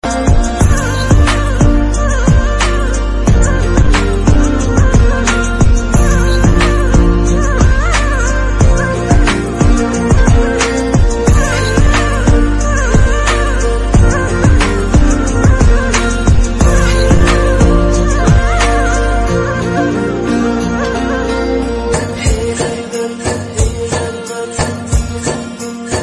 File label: Music Ringtone